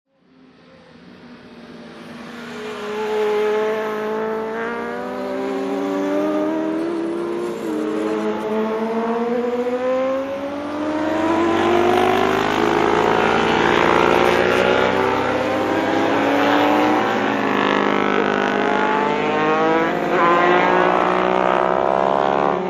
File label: Races, motorcycles pass by